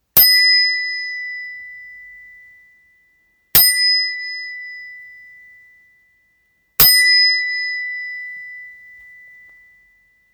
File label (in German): Rennglocke